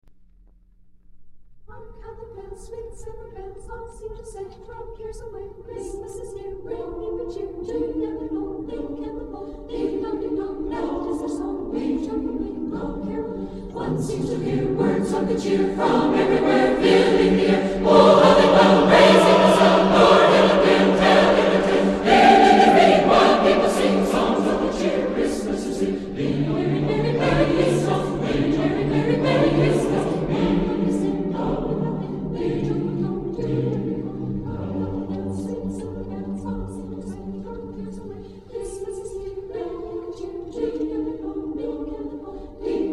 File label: Carol Of The Bells Singing; Christmas songs